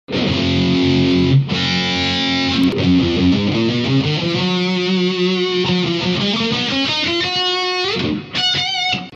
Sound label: tubeman